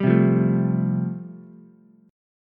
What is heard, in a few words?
G 7th Suspended 2nd Suspended 4th G chord, Ukulele; G7sus2sus4 strummed